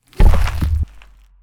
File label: step; brainrot; leru leru le penguinel